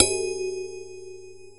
RIDE; Real Oldies2

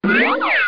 jump; How Many Bugs in a Box

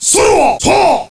sumo roar

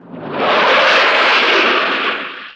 rain

